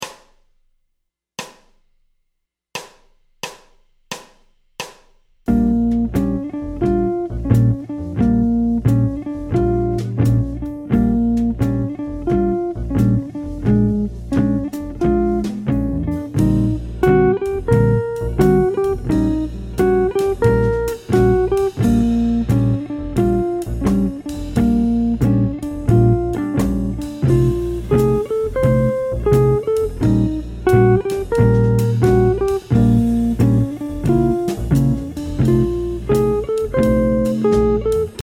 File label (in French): Blues rythmique, guitare jazz